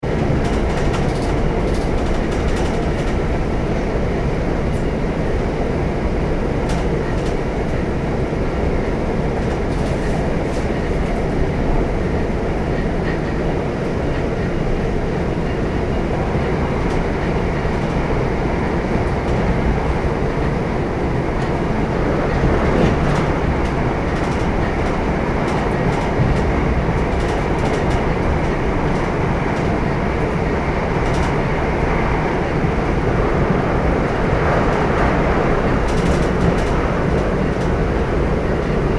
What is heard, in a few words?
transportation train electric run, Train Running Fast (Onboard Recording); Trains; Vehicles; McKinney Sound